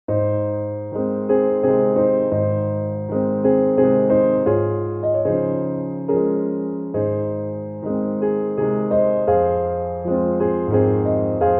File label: waltz concert; brahms; op39-15